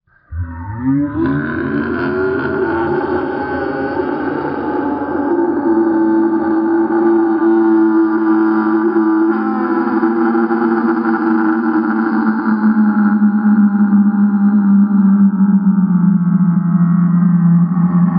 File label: howling; anemoi